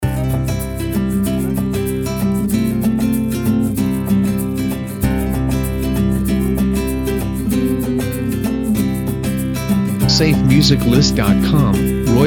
Relaxing Under The Sun

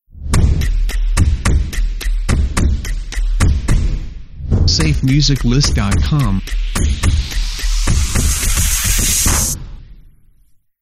Short Stomps Percussions Intro

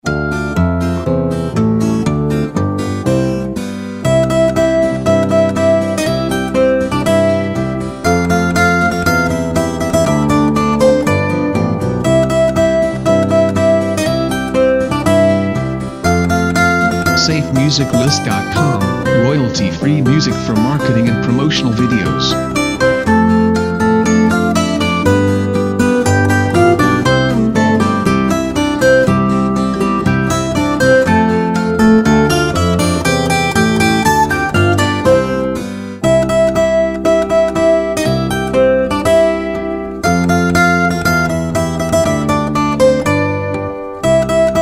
Jingle Bells Acoustic; SafeMusicList

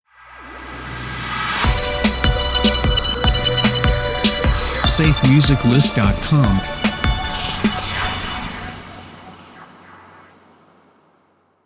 Hi Tech Electronic Logo; SafeMusicList

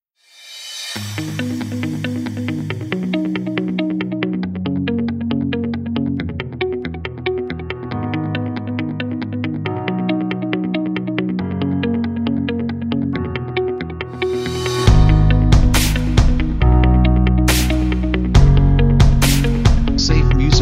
Quiet Inspiration

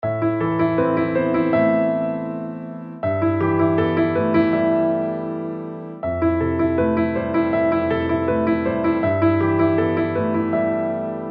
Piano Sadness; SafeMusicList

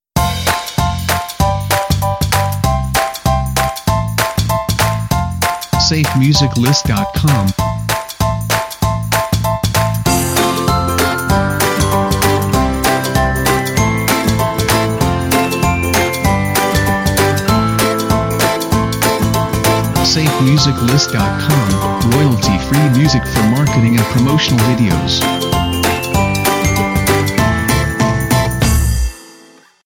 Feel Good Trip Intro; SafeMusicList

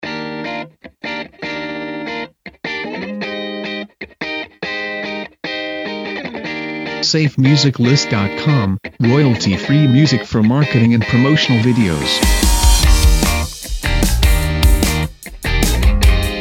Indie Rock Sensation; SafeMusicList

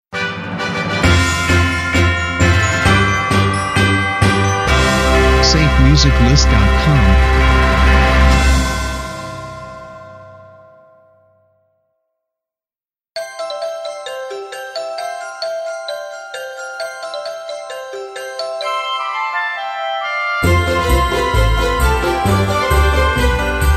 Christmas Fair Video Intros; SafeMusicList

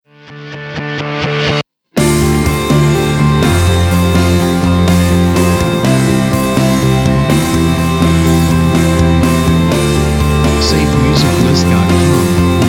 Energetic Uplifting Rock Opener; SafeMusicList

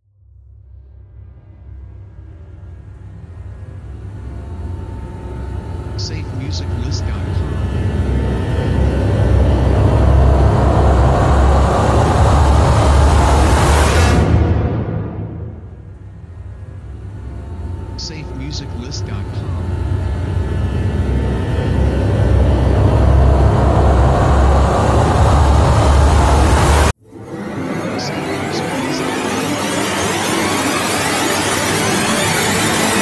Suspense Tension Build Up Rising Sound Effects

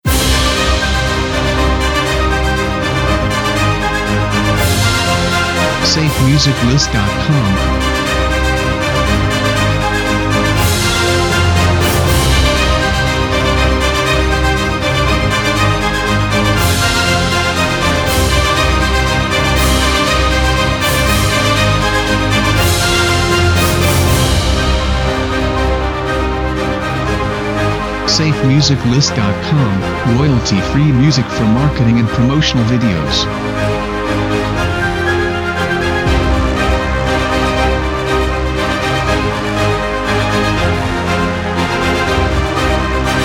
Snowstorm

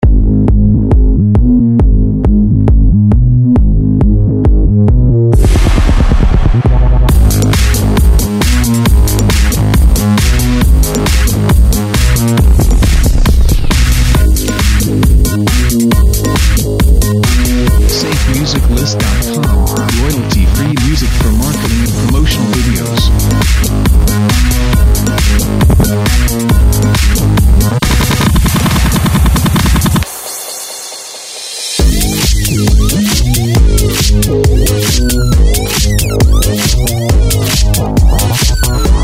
Groovy Dance Moves; SafeMusicList

